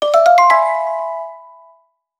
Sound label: Sign Alert; Sony Ericsson; Xperia X1; PlusFourSix; Venus; Lars Edvall